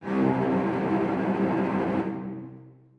cello trem C2, Strings; BBC

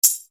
HOUSE TAMB, Yamaha RY-30

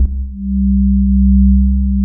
DWGS SINE; Pro-Rec - Synthsonic

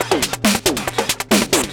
drm 138, Techno, drum-loops; rhythm

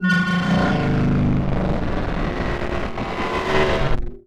WRENCH; Rarefaction; Poke in The Ear With A Sharp Stick

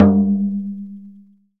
LO TOM, VINTAGE FUNK; Zero-G; Funky Elements

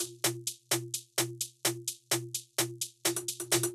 Drum Loop Shaker FX; VEC5; Multi Loops

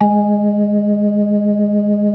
B3 G#4; B3 LES

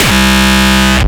Speedcore Kick

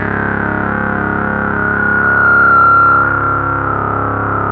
ARP 2600 C#2, synthesizer; Hollywood Edge - Giorgio Moroder Rare Synthesizer Collection

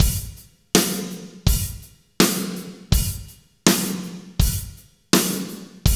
Drumscapes Roland, Pwr Ballad; Northstar

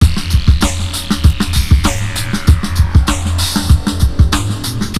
Beat; AMG

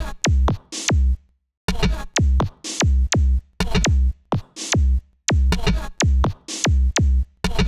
Fx Loop 125; Equipped Music

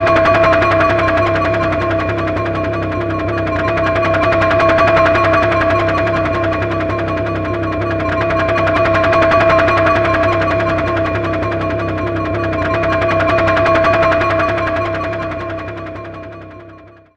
PropellerHaze A, PropellerDrones; Zero-G Seismic Frequencies

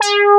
AN SYN, SYN & LEAD; SAMPLE WAVE MIXING 2

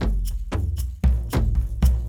Kendong, World Percussion; Brekete, Shaker

